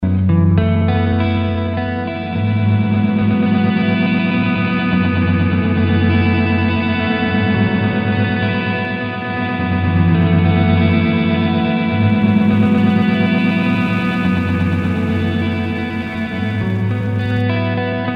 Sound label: guitar, roland sde330; guitar feedback